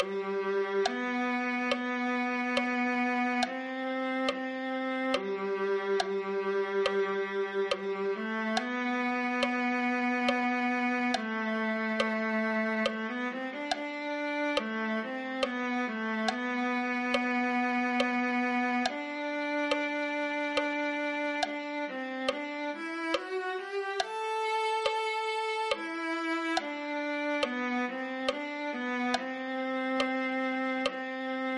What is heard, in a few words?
viola